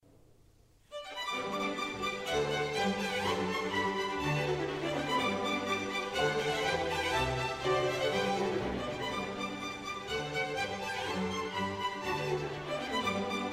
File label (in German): Serenade G dur Rondo Allegro; 250 Geburtsjahr Mozart